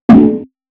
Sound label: Snares, Alesis Performance-Pad